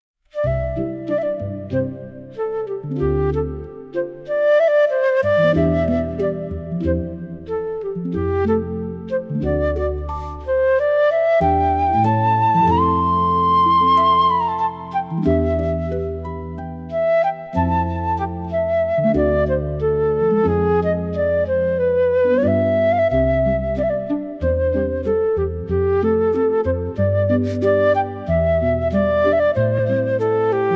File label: FLUTE